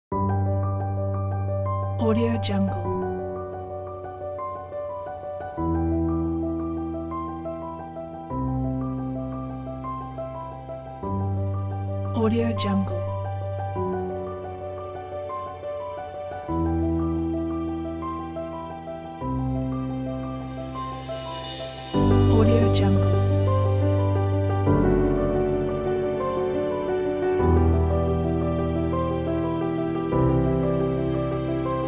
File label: inspiring piano emotional orchestra pack; musicdream